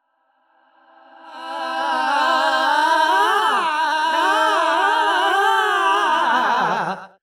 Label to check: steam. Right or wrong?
Wrong